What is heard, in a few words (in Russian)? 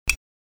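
выключение лампы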